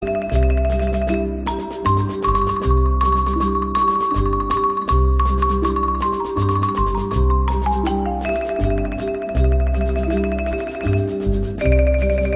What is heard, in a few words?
bolero marimba ringtone